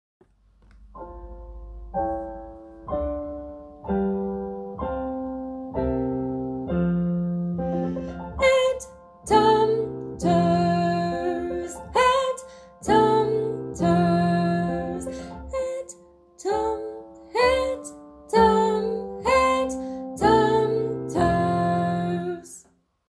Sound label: head tum toes song with piano